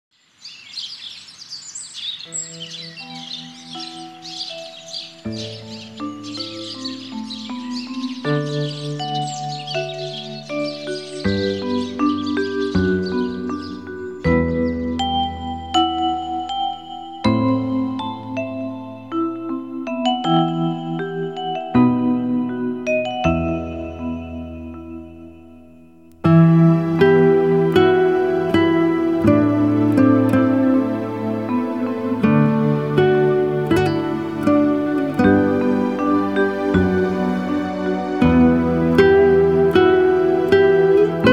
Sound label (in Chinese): 轻柔的背景音乐